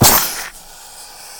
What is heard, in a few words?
projectile launch; Dota 2; Plague Ward